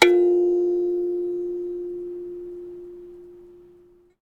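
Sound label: KALIMBA G2; Synclavier Percussion; World & Orchestral; ILIO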